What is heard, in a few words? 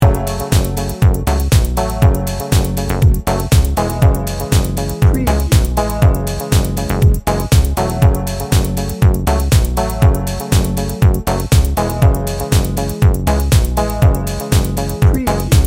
moody dancer